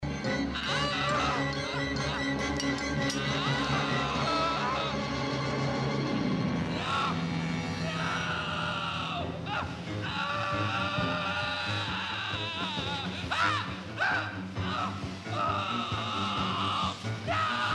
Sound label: dave screams; intruder 1989 horror movie; Daffy Duck with a kidney stone